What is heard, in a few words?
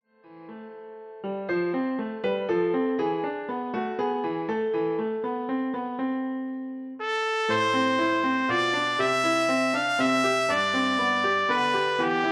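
Greensleeves, Trumpet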